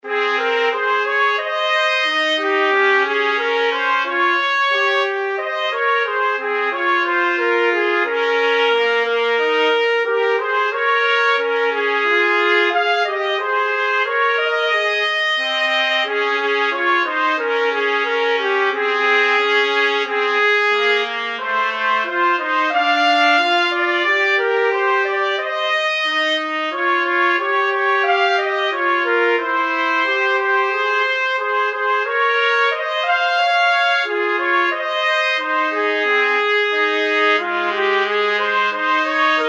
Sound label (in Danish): Trompet og Trompet; Nu skal pulsen op.